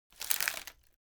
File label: object wood crack slow soft; smartsoundfx